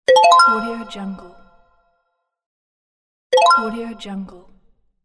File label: new message